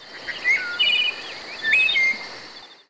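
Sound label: Nature System Start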